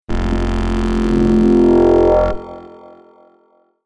Riser Distorted Evil Robot Uplifter Raspy Spacecraft; Sound Design FX; Ascending Sound Effects